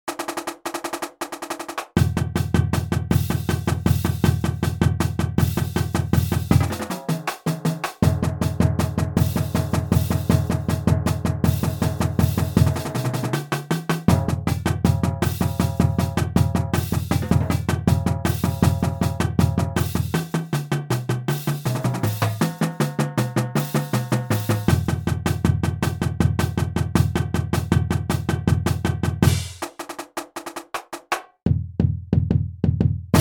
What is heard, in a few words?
perc warmup, marching